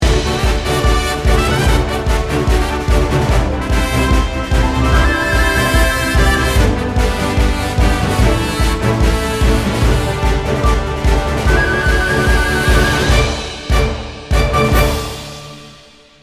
Casino Fanfare; mist train girls

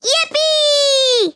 Yay; PiratePig

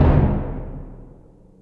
B Drum; Torchlight III; PS4

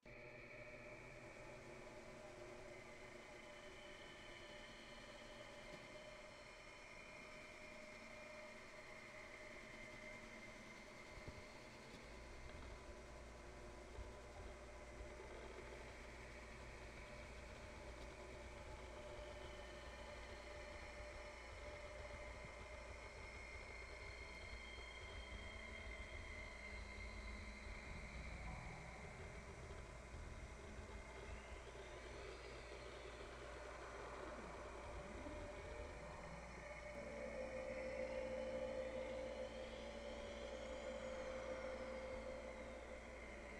Ambience Lab; Vernal Edge; PS4; Xbox Series X/S

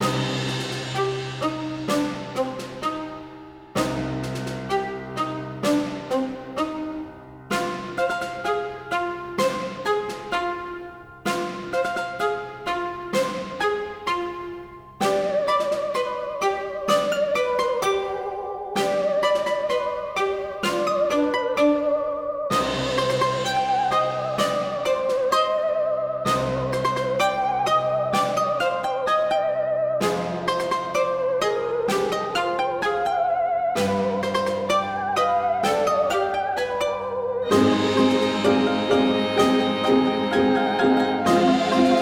Balalaika; 60 Parsecs

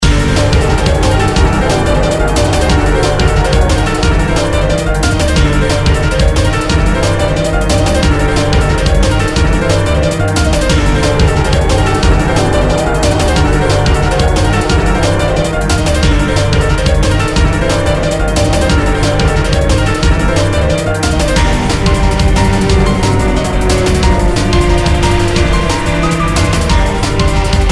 Raid; mist train girls android ios mobile gamerip 2020

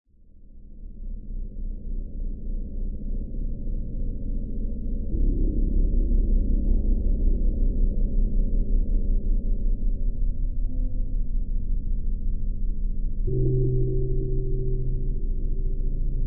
Dangerous Suspect Drone

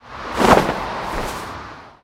movement flying jump fan

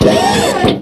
floor lift; fashion room sfx

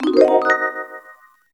item get; Shadows of the Damned; Mini Game - F Item Get

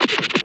Dog Scratch; Prison Architect

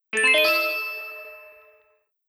Jingle - Get Item

